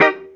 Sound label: GIT CH D#; Sounds Good - Roots Reggae; GTR CHD